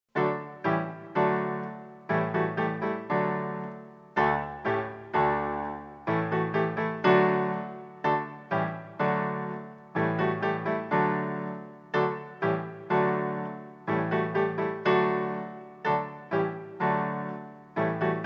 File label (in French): lapin instr